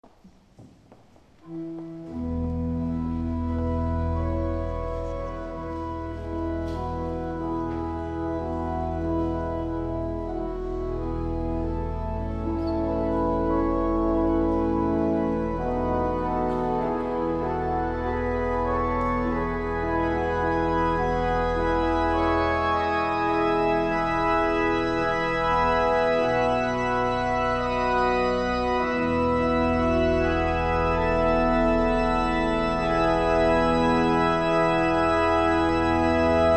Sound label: Organ